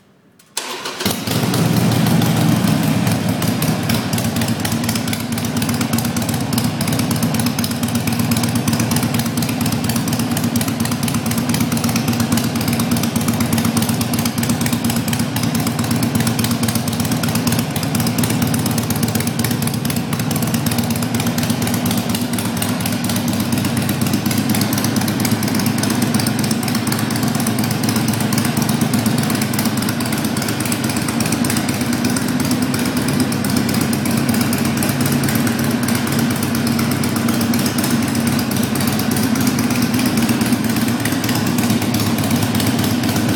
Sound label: Wyatt Gatling Slip on Mufflers